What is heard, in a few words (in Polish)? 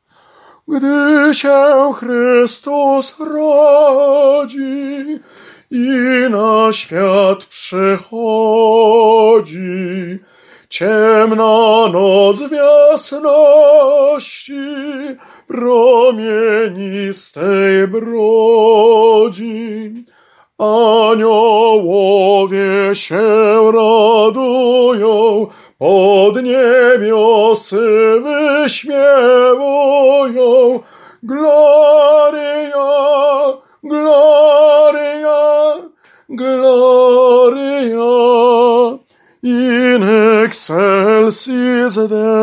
Tenor; Gdy sie Chrystus rodzi